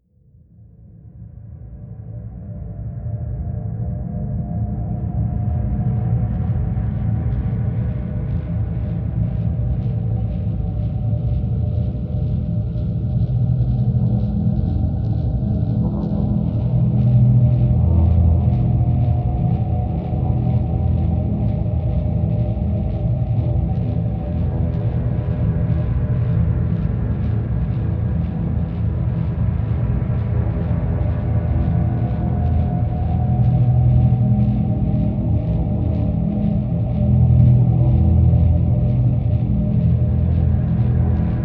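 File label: drone hypersleep e; brand x music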